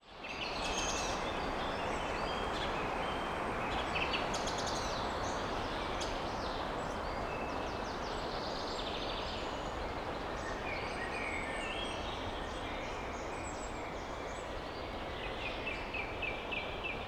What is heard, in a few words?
European Nature Deep Forest Ambience Wind Blowing Birds Chirpin; Cinematic Sound Design; Deep Forest Ambience Wind Blowing Birds Chirping